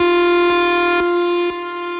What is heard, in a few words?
Lead - F